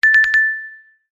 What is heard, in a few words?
multimedia notification bell chime ping ring tone